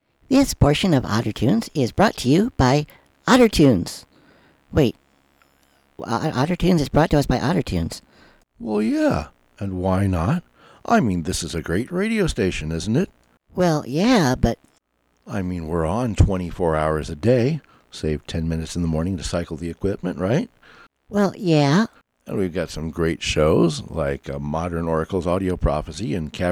otter tunes

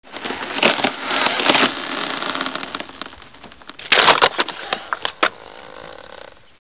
ice cracking

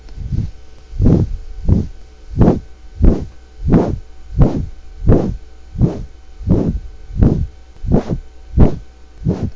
wing flap

